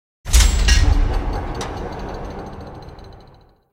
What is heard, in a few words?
intro endless